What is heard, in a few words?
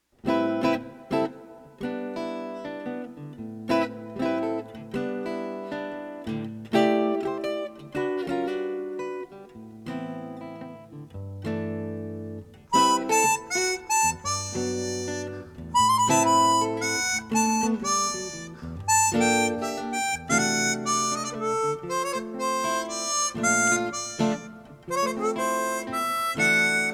Waltz